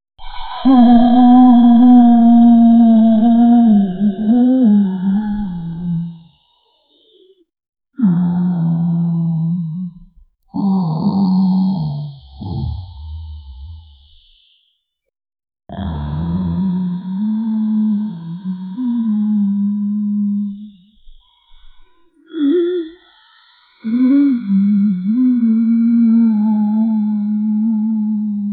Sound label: baby dragon sounds, chirps